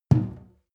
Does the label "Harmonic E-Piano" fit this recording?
No